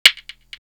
Percs

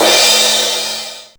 Crash, LinnDrum

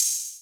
Open Hat

